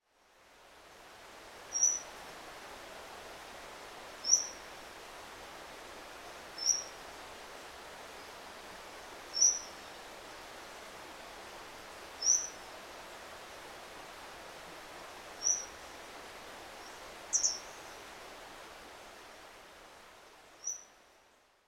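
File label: Grey Wagtail; koe; kisekirei; chichibu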